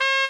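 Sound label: trumpet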